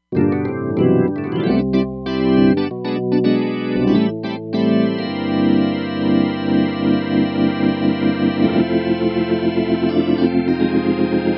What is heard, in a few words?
FullDraw Org, Roland Fantom XR